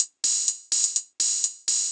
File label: Hats 125, Perc Loops, Old Skool House